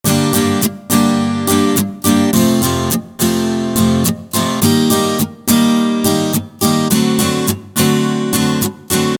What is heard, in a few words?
Virtual Guitarist